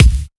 dry kick single shot b key, bass drum sound tuned to the B note